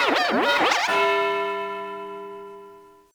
Pass friend score; LittleBigPlanet 2; PS3

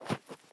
snow jump; teardown; ps5 windows xbox series xs

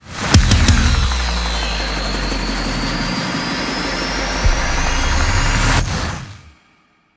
transition; SkullHacker transition03

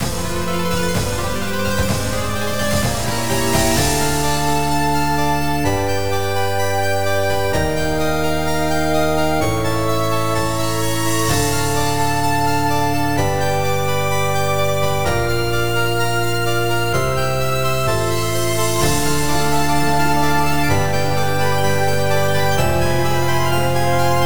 Victory; Tiny Rogues; Shadow Planes Victory

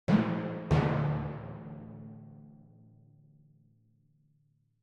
Timp Hit - Fill

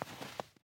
snow step

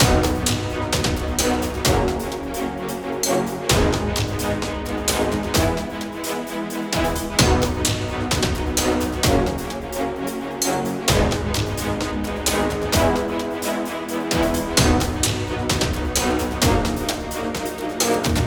Orchestral Loop

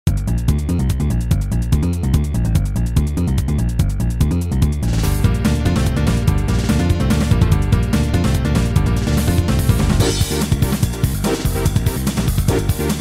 stinger

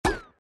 Mouse Shoot; Fancy Pants World 1 Remix

